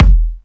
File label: fat tick, Kicks Loud